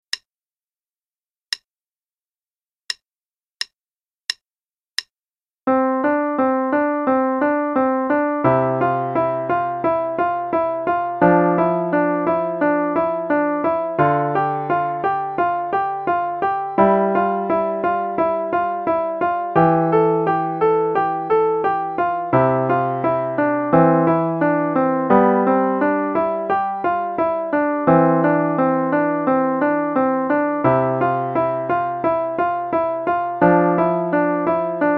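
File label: Play-a-long, Exercise, no click, quarter note=88; Kohler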